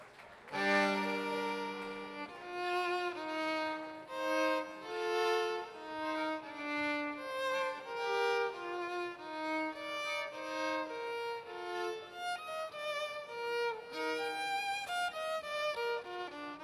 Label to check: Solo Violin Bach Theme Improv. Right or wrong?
Right